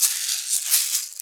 SHAKER, PERC; AKAI S-Series CD-ROM Sound Library VOL-2

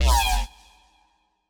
WOAH bass

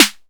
OVERLD SNARE; Greytsounds VOL-1; CLASSMACHINE

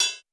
Roland U220 Dry Set

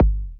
kick, Millenium MPS-400

